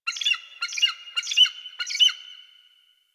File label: Zone Tree Critters, Ambience Plains; Warframe; Eidolon Plains; One Offs